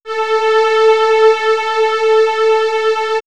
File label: Swirls String, pads; X-Static Goldmine